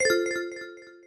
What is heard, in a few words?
error; CursiveLetters